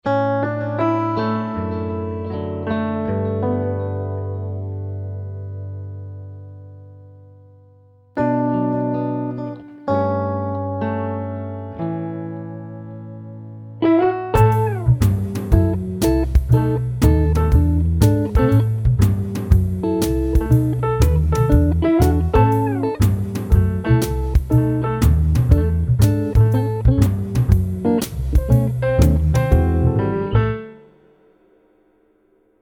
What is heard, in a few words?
sunny swing